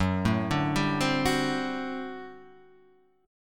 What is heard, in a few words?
G Major 7th Suspended 2nd Suspended 4th Gb